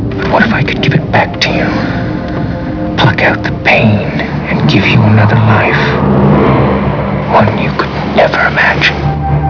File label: pain